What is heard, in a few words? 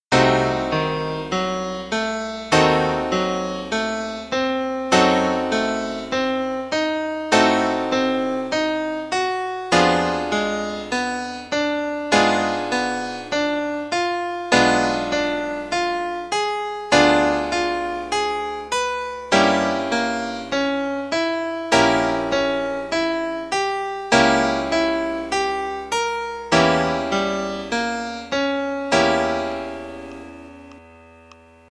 diminished